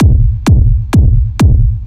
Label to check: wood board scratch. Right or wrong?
Wrong